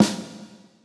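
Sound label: drum snare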